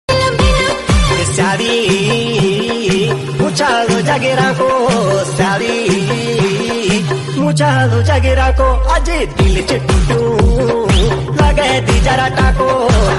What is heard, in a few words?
Garhwali Song Ringtone